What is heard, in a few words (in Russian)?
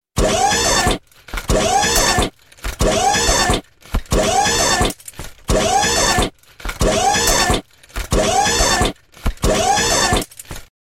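робот идет движется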